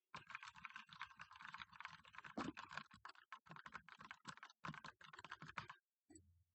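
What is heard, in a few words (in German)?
Schreiben, Tastatur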